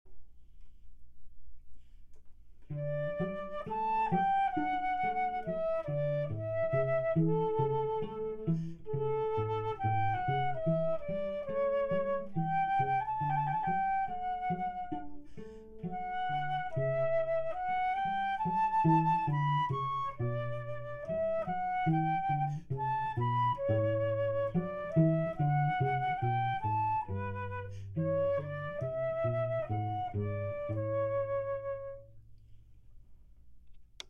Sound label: Largo, Flute; Four Seasons